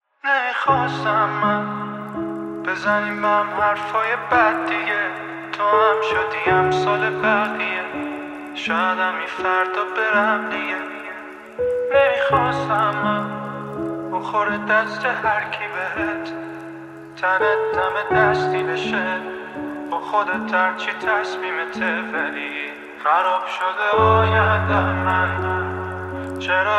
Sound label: Piano